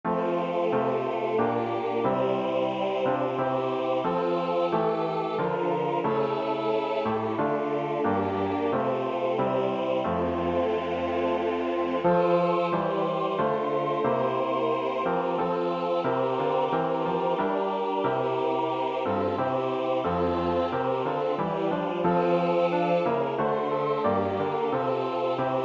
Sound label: for satb choir